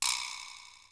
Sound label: Vibraslap, Percussion; Giga Samples Collection; ELQ-DrumQit; rock toms